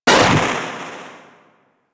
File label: Syndicate Power Activate; Warframe; Syndicates; Generic Powers